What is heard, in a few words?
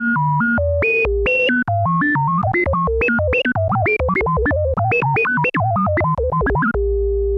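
Sample and Hold Filter, SFX; Syntec; Wall of Sounds VOL-2; TECHNO-BOX; 90s sample CD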